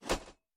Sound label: sting rank ui spin; Armello The Bandit Clan